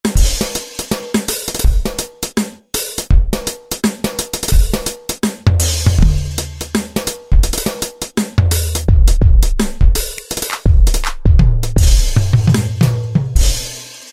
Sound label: Street Beat; Factory Preset examples